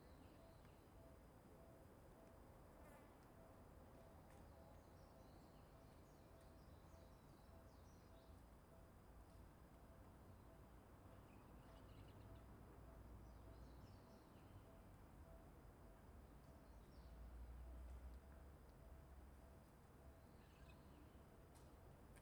Stereo, walk; Fild recrding walk

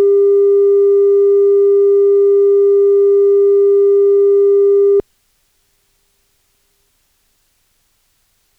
CAL 0dB 315Hz

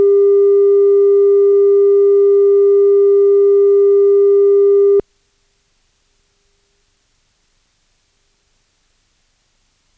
CAL 0dB 315Hz

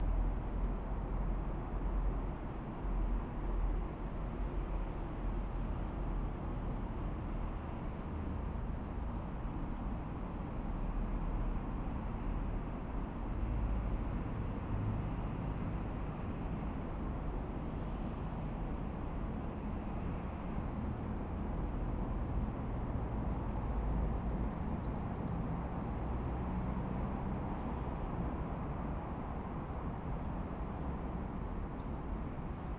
Binaural, walk; Fild recrding walk; ZOOM F6 MultiTrack Field Recorder

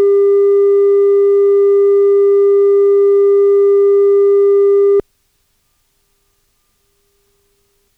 0dB 315Hz

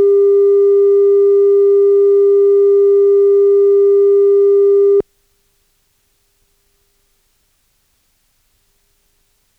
315Hz 0dB

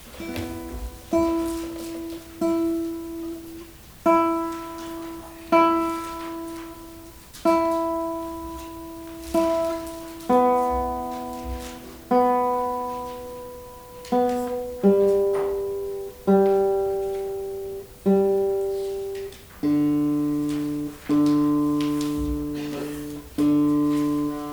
ECM-S1 guitar; ZOOM M2 MicTrak

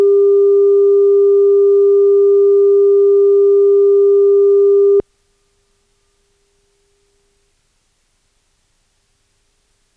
0dB 315Hz

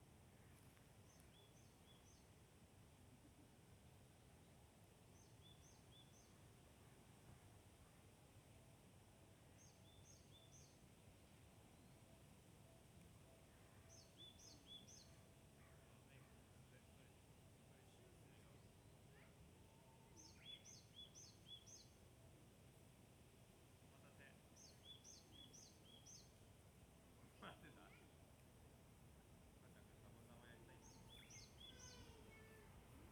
Binaural, walk; Fild recrding walk

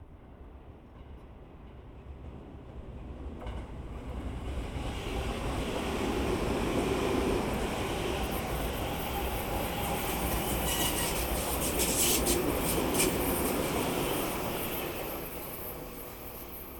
Binaural

